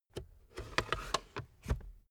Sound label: car backseat table fold in slow